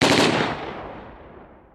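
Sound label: dist gun, distant gun, ambient outdoor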